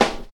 dry snare drum sound g key, snare sound tuned to the G note